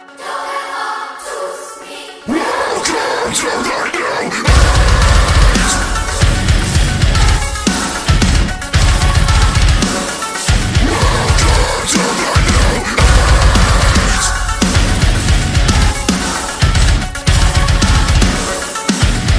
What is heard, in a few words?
Slamming Breakdown